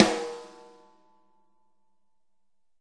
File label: DW Map Sn, Real Kit; REAL LIVE KITS